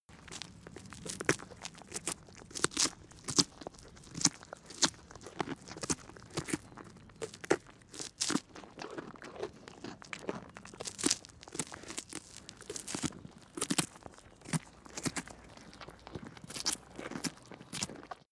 goat eat carrot

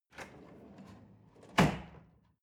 drawer large trash open hard

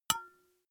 glass metal small impact damped

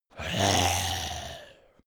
zombie moan idle

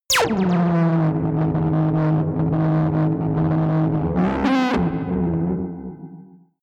warp load

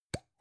gum plastic box open soft

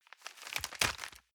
envelope swap multiple

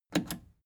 plastic button scratch smooth click disable fast

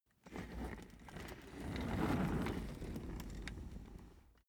office chair trundle slow long

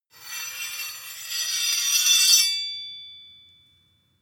sword blade grind long

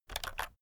keyboard external shortcut short

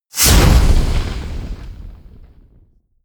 burst

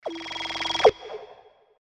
hf sweep

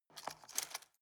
cutlery plastic drawer grab

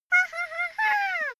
child laugh fake haha

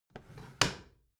bathroom drawer close regular

